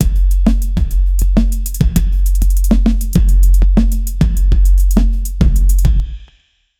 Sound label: fantasy type drums edm